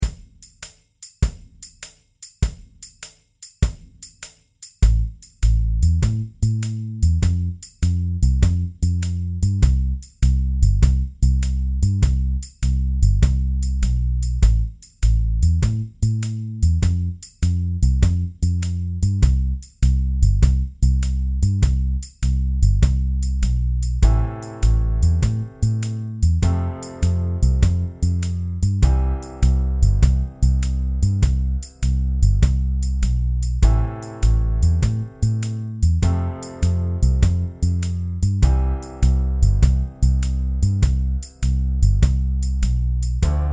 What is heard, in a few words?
100bpm shuffle